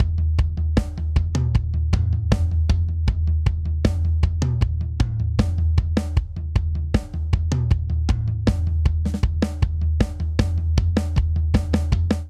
Straight Reggae Breakdown 78 bpm; 4 4; MIDI Clips; Ableton; Factory Packs; Drum Booth

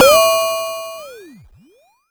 wriongtrumpet; kidGlitch; Ableton Foundation FreePacks; Wicked Kits

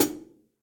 Hihat Multirod Foot Dry Acoustic; Drum Hits; Standard Hits; Ableton; Factory Packs; Session Drums Studio

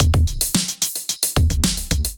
Kit groove 110 bpm; Selector Kit Producer groove 02 110 bpm; Ableton; Factory Packs; Drum Essentials

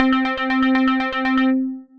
Nebulous Sequence, Synth Rhythmic

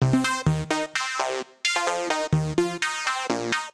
Fuzz Pluck (Cm) 128bpm; Music Loops; Sledge Fuzz Pluck; Ableton; Factory Packs; Singularities

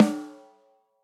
SNARE stick center

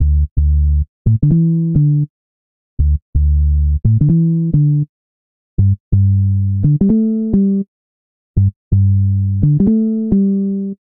OC Bass D Major 86bpm, Bassline; Tonal; MIDI Clips; Drive and Glow; Ableton; Factory Packs

